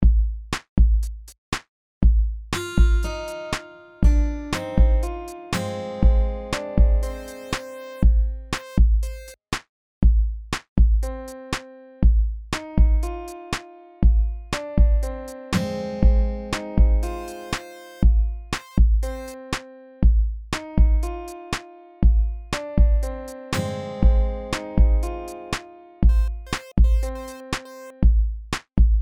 MIDI

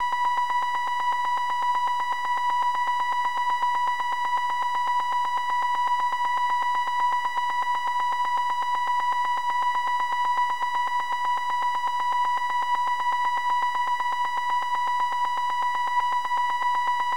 sine wav

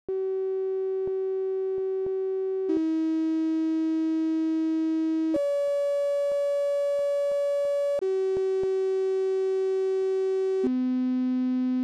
sinewave song

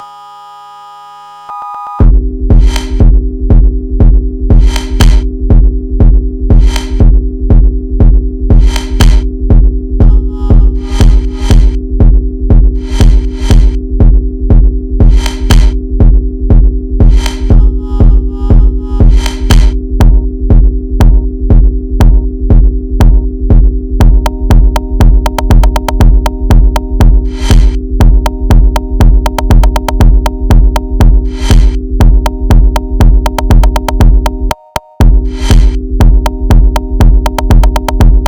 Sine Wav

